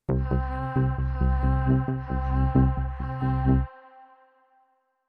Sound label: Sting